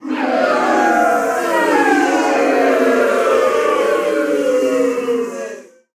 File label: Crowd Boo; Pitch Black Audio Pong